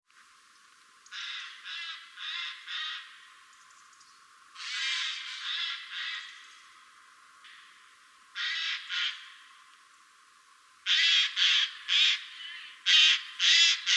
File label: Garrulus glandarius; SETE